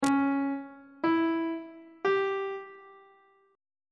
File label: c flat harmonic minor chord 2 is d flat diminished; on treble clef